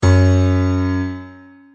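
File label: F sharp 2 piano; notes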